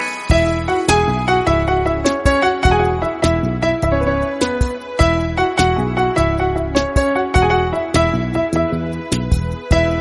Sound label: instrumental tone ringtone, bollywood ringtones